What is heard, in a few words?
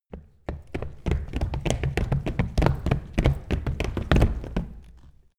step group run full sequence; schritte; menschen